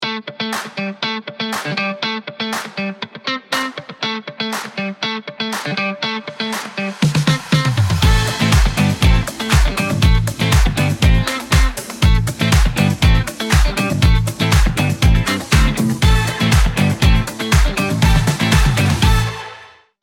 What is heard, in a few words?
music funky disco